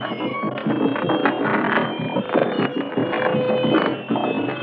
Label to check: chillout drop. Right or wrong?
Wrong